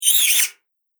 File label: Weird Noise Gremlin Spirit, ghost; Horror; Andrew Fleming